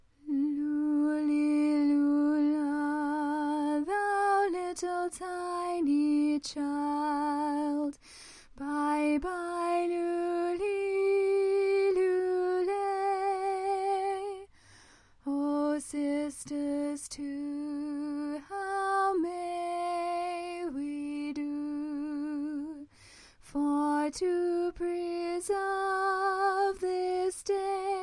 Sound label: Coventry Carol alto, choir